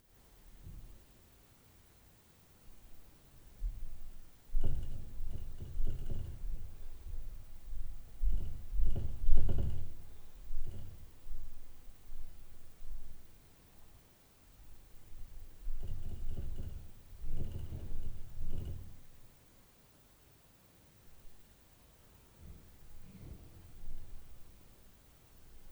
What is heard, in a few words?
LF UPSTAIR NOISE, Night Noise